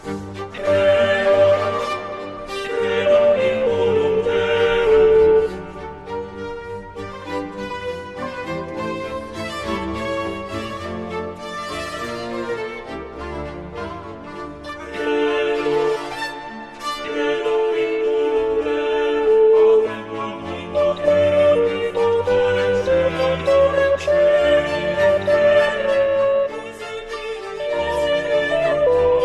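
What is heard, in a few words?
Credo (sop), VirtualVoice; Stage Zelenka 2023; zelenka zwv21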